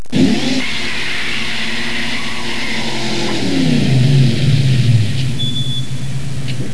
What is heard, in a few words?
sound mustang, custom